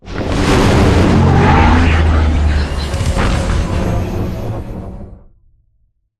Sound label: strafe close; Beacon; Titanfall 2